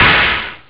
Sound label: DARK chh; haunted; jazz jackrabbit 2